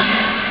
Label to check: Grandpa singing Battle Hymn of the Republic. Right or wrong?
Wrong